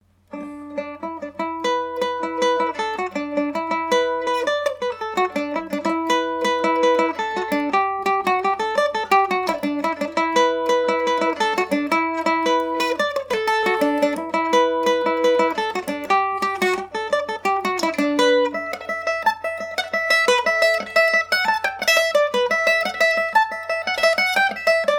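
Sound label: E dorian, Irish mandolin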